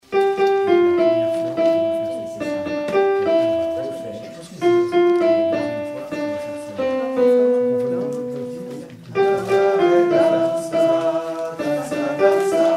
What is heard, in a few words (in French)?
alto; Bi bi